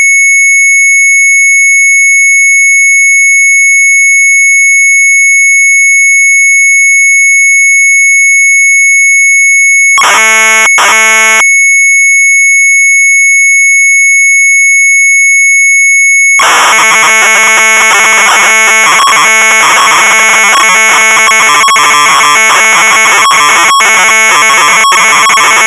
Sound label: MZ800 Tape; sharp; Memory II; retromelsarchive